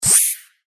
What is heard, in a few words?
cancel; itgmania; portKit-sm4